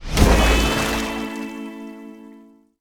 Wild Water Wild Expand

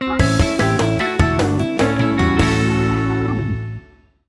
High Win; WildWater

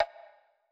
bonus Spin

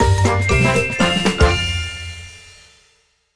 free spin end; netent

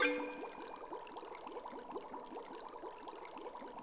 spin legacy; netent

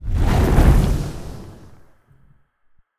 reel symbols rotate; netent

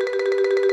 count Up Loop; netent

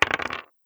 dice coll last; netent

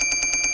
count Up Loop; netent

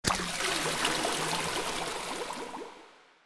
start Respin; netent

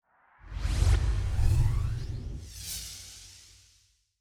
sparks switch Mode; netent

